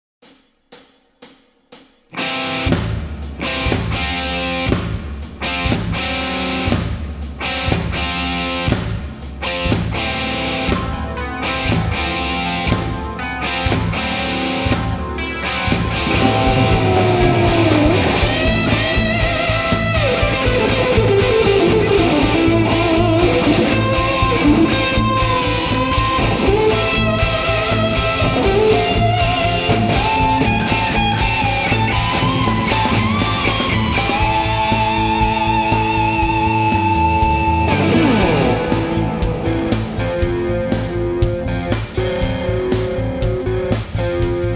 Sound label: Metal; Meltdown; Total Meltdown